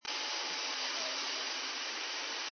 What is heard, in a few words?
transient call